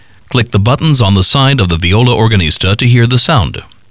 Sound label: viola